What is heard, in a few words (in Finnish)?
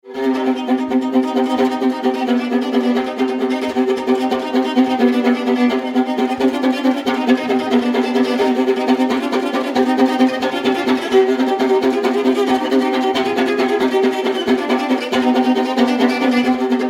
arpeggio hiljaa